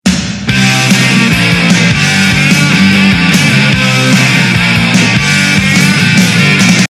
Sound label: rock button